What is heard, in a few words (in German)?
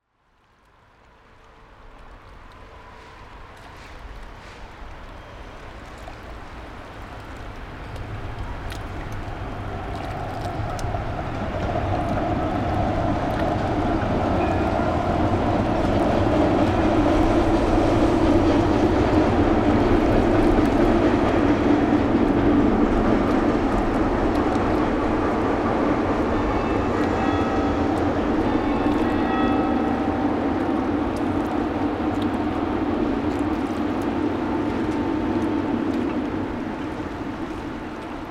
Tram Wettsteinbrücke